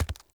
Walk Default, Footsteps; Enemy; Mockeries; Mockery

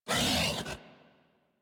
Feral Vocal Melee Attack; Enemies; Kubrow; Warframe

